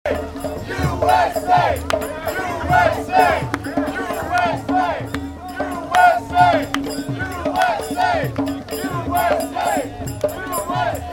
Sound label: chant drums; alt right performance art; usa